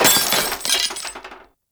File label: Desktop Glass Metal Destroy; Warframe; Entrati